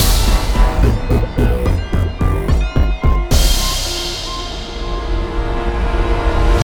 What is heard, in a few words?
Stinger; Melting Chamber